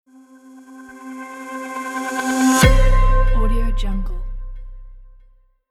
short impact logo reveal

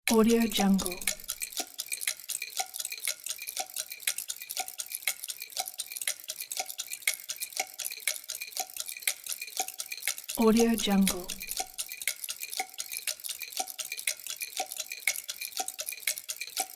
clockwork

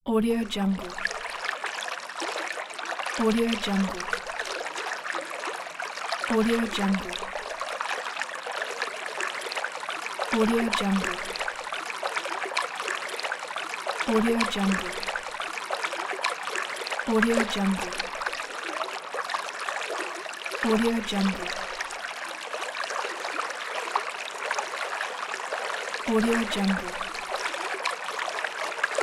water flowing

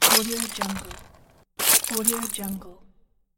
ice breaking

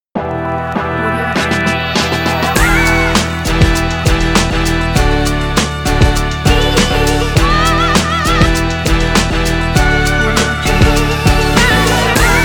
old school hiphop logo; puremusic

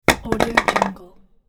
wood block bounce; electronplanet

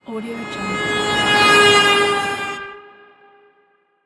car horn doppler; yellowcatt

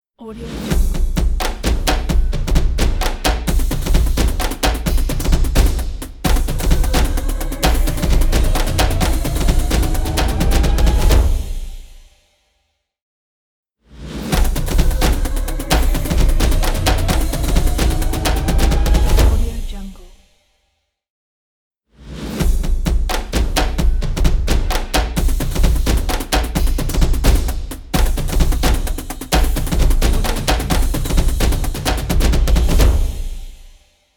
percussion intro ident

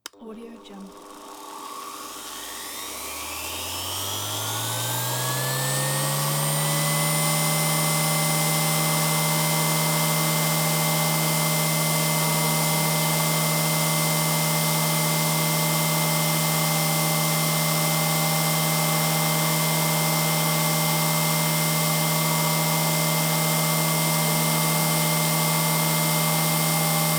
bench grinder motor

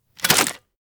camera surreal 35 mm shutter; prosoundfx

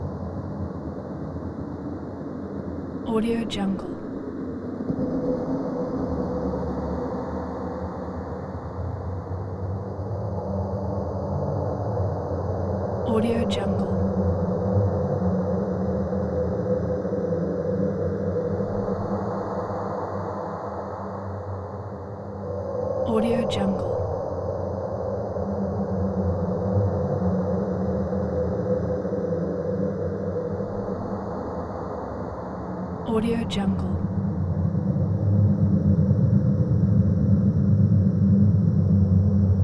behind the waterfall loop roomtone layer; esmteam

